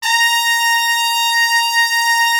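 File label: trumpets section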